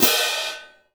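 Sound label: cymbal, Orchestral; ILIO; Synclavier Percussion 2; World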